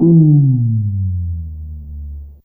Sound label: Ooh; Humans2